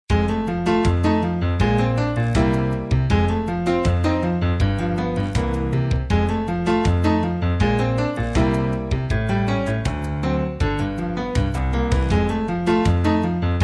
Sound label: ez pop